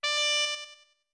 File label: Pipes D2; Deku Pipes